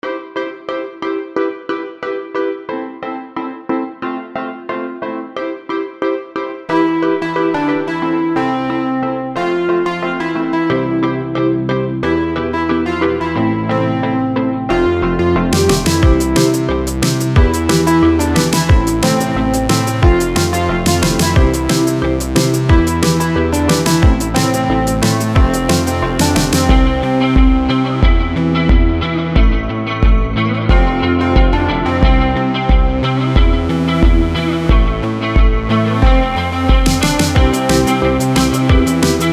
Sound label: neue Deutsche wurli